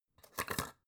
folder clamp flip

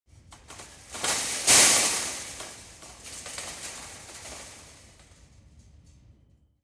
land metal fence

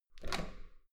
metal door heavy handle down hard

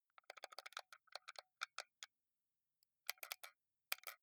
console controller xbox play sequence normal

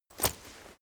leather metal walk normal single

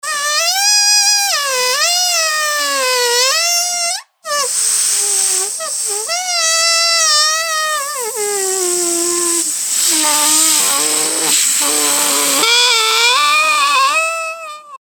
ballon squeek

